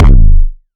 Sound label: SHARK GURA KICK